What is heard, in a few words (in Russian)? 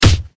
oglushitelnaya nastoyashaya poshechina zhenskoj rukoj